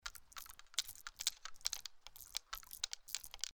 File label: Spawn SFX; Gut Eater